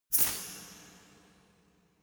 resonator hit double hard

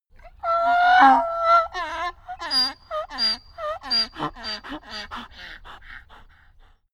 donkey cry complain

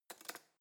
cutlery spoon drawer place soft

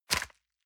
envelope grab hard

